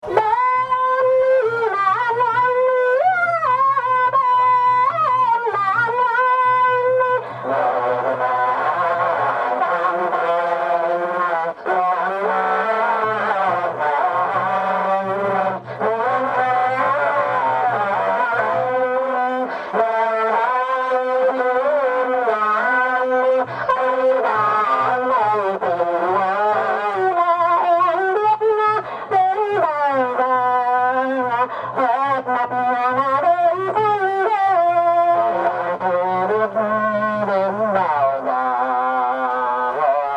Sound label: festival distortion; Distorted singing at festival (1), near Bac Ninh; Vietnam; I recorded a lot of sounds in Vietnam